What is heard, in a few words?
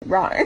rawr